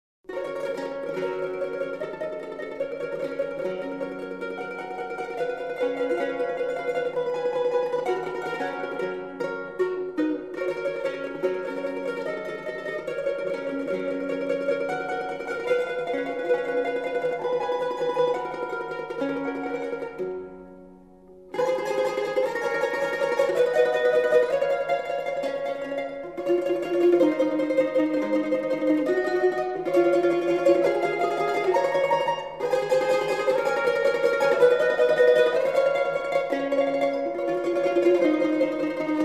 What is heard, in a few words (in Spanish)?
pizz mi